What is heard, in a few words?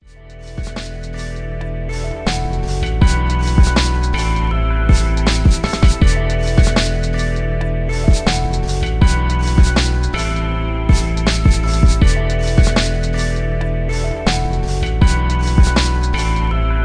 Instrumentals Neo, 160bpm